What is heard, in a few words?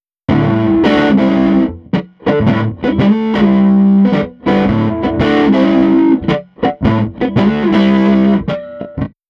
guitar; audio ease; speakerphone